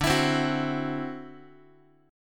D 9th Guitar, chord; strummed; JGuitar

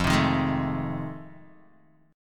F 7th Sharp 9th, Guitar, Open D

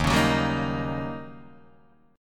Eb 7th Sharp 9th, Eb, Guitar, Open D

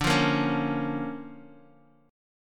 Bb Minor, D; strummed; chord shape

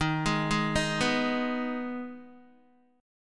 D sharp Suspended 4th Sharp 5th, Guitar; arpeggiated; chord; 10,11,8,8,11,x; JGuitar

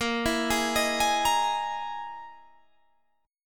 E Major 7th Flat 5th chord

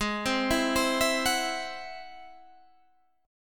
Ab Augmented 7th Ab Guitar Standard; arpeggiated; Ab+7; JGuitar

